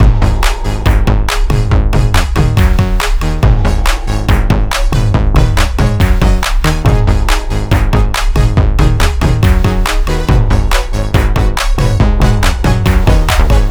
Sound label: CUTSCENE MUZAC DANCE SHORT; high hell